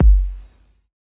808 Kick Dirty Hit

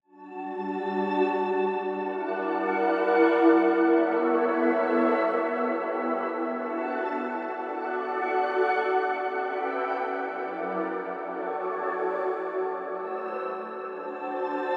Pad

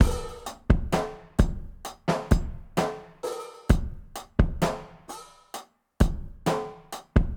130 Kit Drums

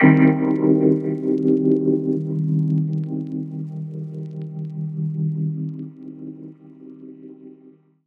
Vinyl Chord One Shot Cm; Ultimate

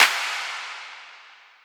snare crash

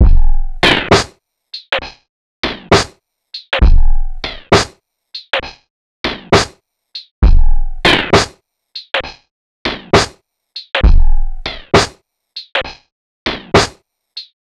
Drums, 133bpm, Layered Full

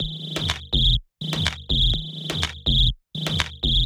124 Top

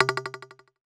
WON TOMS PERC

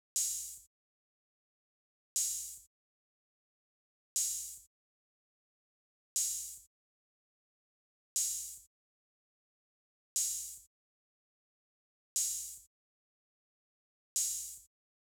Open hat 120BPM

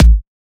kick

